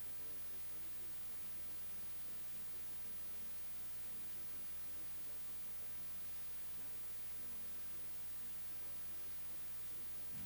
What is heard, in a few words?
test noisefloor FM mono